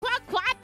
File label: quack quack; oozora subaru